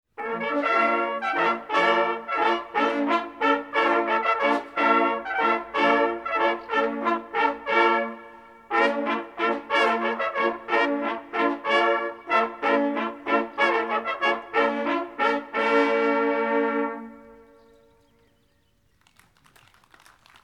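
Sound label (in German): Das hohe Wecken, Jagdhornbläser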